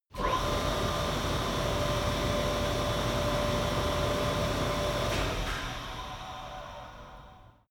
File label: flue gas installation start running stop